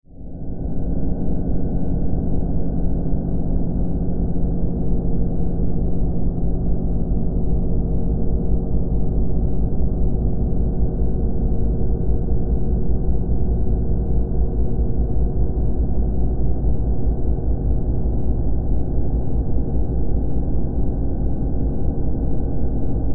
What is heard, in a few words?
Sci Fi Ambience, Sci Fi Interior Spaceship Engine Room Basement; Spacecraft